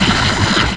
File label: oil drop